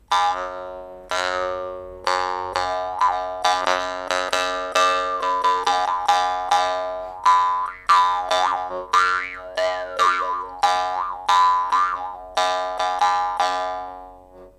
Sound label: wooden frame